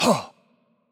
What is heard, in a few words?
hurt light; bloom minigame